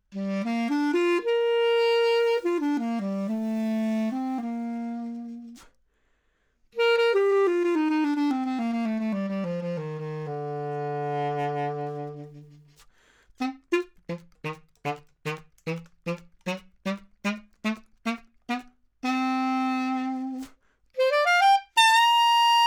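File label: Clar Bb 20cm High, clarinet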